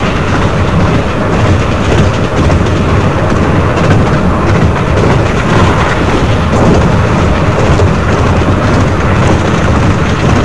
run, Class 47; OpenBVE; Train

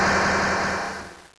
Air High, Train; Fgc a9

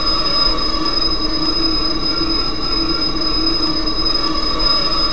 Rub, Train; OpenBVE

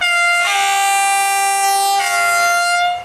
klaxon, Train; OpenBVE; Carmillon

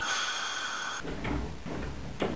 Door Open, Train; OpenBVE; Orange

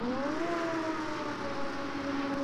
Traction blower restart ext, Train; OpenBVE; Cl313 Silverlink

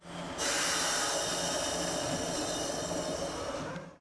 air zero; OpenBVE; 103KCJ-LowerCab

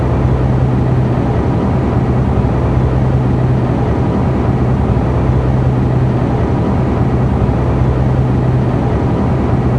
Motor, Train; H-Start 426 Siemens Desiro

